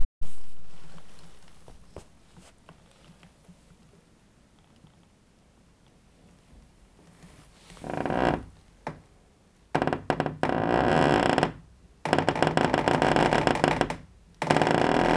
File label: squeaky door; fieldrecs